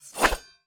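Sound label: cavalry sword, weapon; Battle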